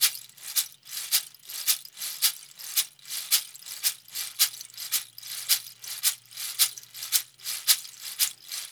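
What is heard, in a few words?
RAIN SHAKER; Spectrasonics; Liquid Grooves 1 & 2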